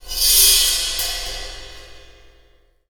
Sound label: Cymbal; Percussion Samples